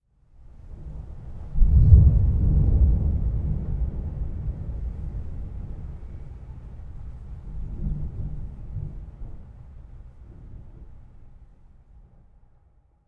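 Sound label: thunder distant; ambient soundscapes; dota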